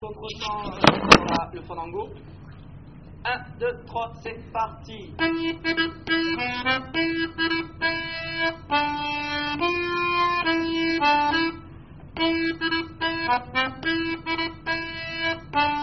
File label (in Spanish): Fandango contrechant